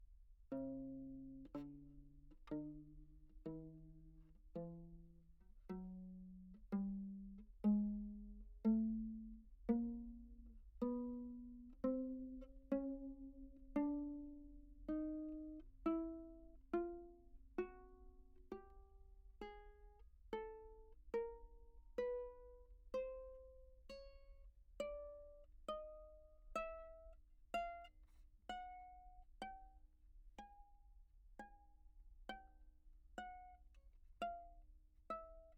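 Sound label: pizzicato chromatic, treble viol; Anechoic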